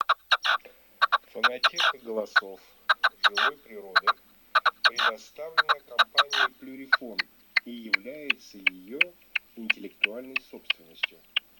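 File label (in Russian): Куропатка красная Pernice Rossa, chip mini 12 для манка plurifon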